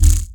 pause retry click; o!mania Skadi Arrows

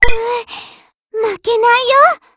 section fail; IJN Yamashiro v1.0.3 (16-9); Voiceover Enabled (Default)

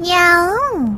match start; niel - amni

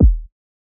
heartbeat; how the sky was

